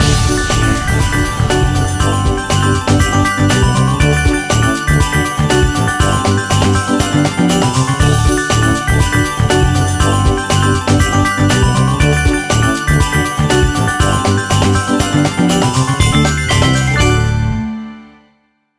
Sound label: big Win; fruit shop christmas